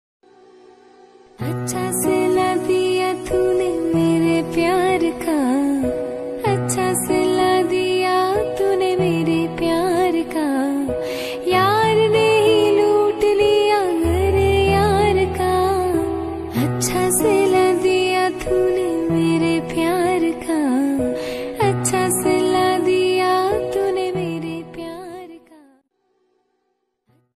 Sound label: female emotional